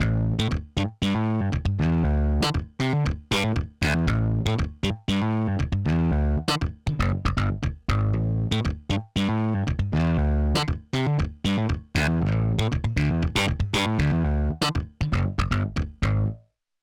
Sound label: tube wet; grit blender; ub dsp